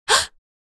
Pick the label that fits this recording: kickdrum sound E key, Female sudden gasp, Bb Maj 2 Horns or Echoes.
Female sudden gasp